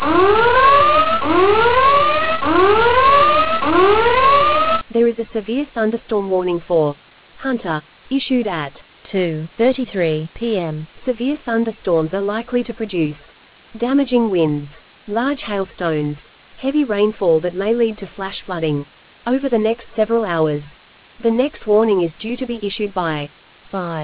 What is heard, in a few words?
storm audio